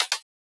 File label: Send Click